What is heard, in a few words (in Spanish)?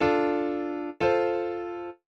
Semicadencia en Subdominante